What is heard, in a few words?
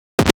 Scratch Fills 140BPM